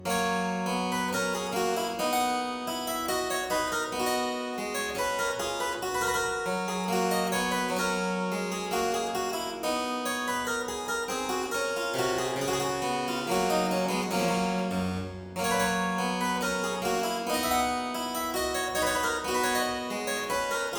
Aria in G major Partita 23, Harpsichord

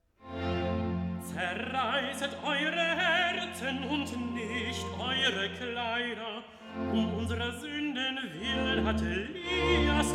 Recitative (Tenor); Mendelssohn; Zerreisset eure Herzen

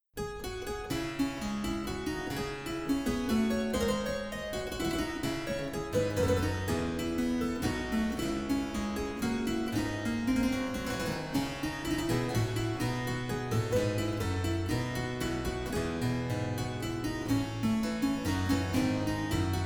Courante in d, Harpsichord; Buxtehude; Opera Omnia; Harpsichord Works; BuxWV Anhang 6

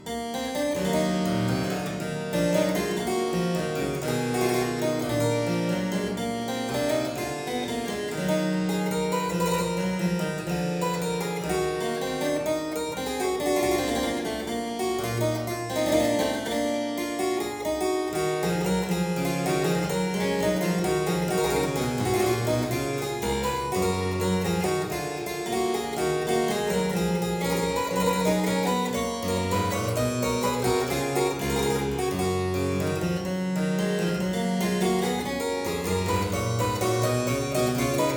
E minor Double, Harpsichord; Buxtehude; Complete Harpsichord Music; Auf meinen lieben Gott; BuxWV179

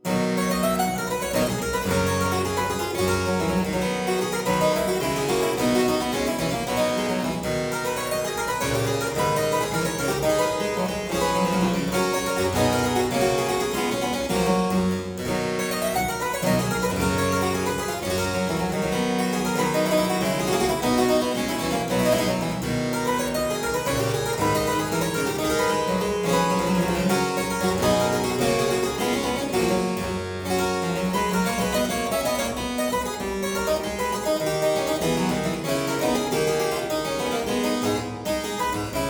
Aria in C major Variatio 4, Harpsichord

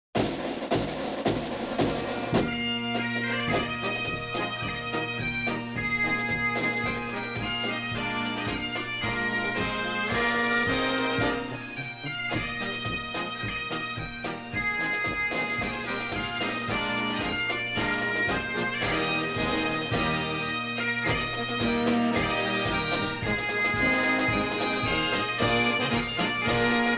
Pipes & Drums, The Bagpipes and Drums of Scotland

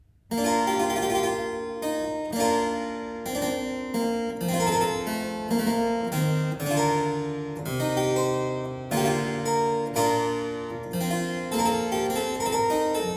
Paven, harpsichord; Byrd; BK100a [EKM10a]

